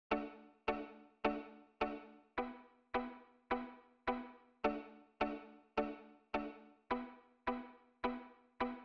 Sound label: Ska Rock Steady on D and Em; اسکا